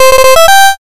fanfare

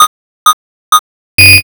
countdown

